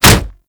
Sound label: Kick Hit Default, Melee Impacts; Warframe